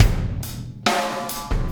Impact Crater Beat, Processed Drumkits; Sonic Foundry (Sony Creative Software); Processed Drumkits Zero Gravity Beats

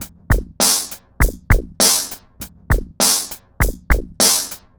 Remixed Drums 100BPM; The Roots Drumloops

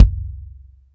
BD, Bassdrums, Roland TD-20

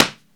DESK, HANDY PERCUS; AKAI S-Series CD-ROM Sound Library VOL-5

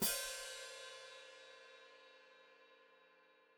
Hat Closed Open, Hi-Hat

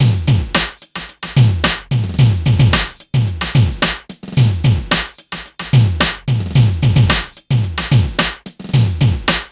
HIPHOP; INIS; Funky Xtreams

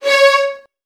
C#2, VN 16TH, Virtuoso Strings; ILIO; Kirk Hunter

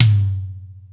Toms 1, drum machine; E-MU Pro-Cussion-1

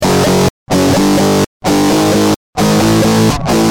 G Jam, Guitar; Industrial

